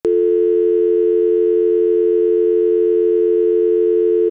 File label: Telephone Dial Tone; Work - Home